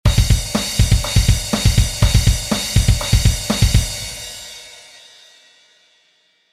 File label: double bass